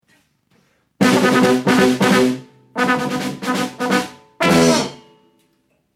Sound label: Jingle